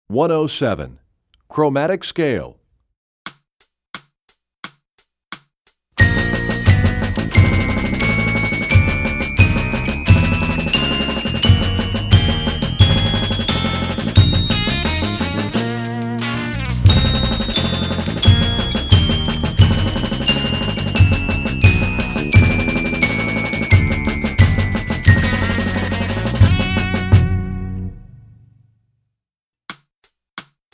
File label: Chormatic Scale, Percussion; EE2000 Book2